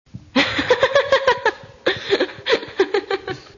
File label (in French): vocale rire